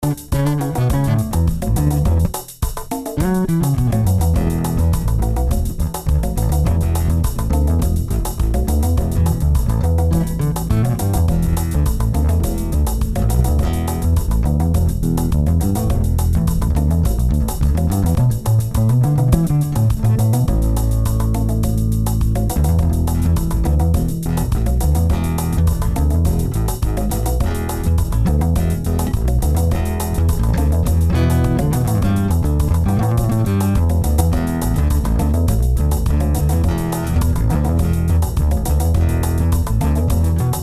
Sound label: 8 string active mode neck pickup fingers, Bass; ShamrayBass